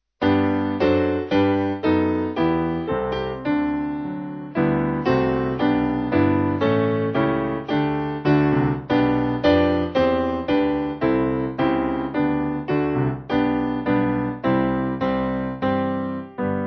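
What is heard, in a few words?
Piano, church music backing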